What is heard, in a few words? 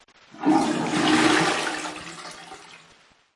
Tesla Lock Sound Toilet Flush